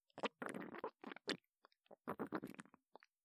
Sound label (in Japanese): くちゅくちゅ